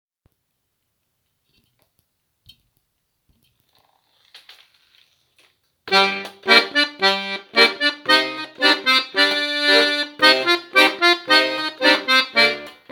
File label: scottish 110bpm; cursus samenspel 2023